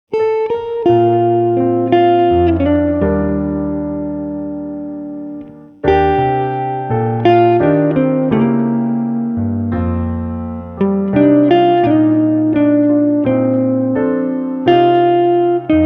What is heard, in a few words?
Piano Solo; from Sibelius mix